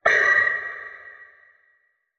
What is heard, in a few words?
Ghostly Ping